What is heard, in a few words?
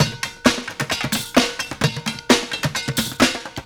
Street Groove, Ambient Grooves